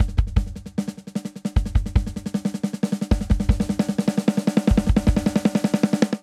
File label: Grunge Rock Buildup 77 bpm; 4 4; MIDI Clips; Ableton; Factory Packs; Drum Booth